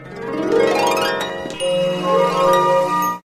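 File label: beautiful harp, very beautiful picked melody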